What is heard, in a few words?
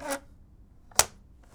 Open Close, paper cutter; Micro Pack